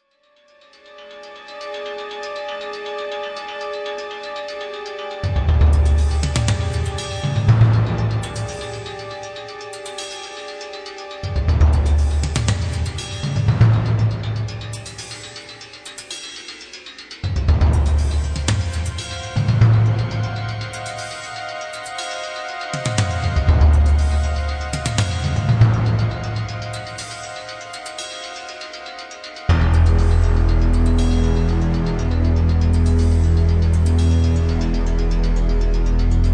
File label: Car Chase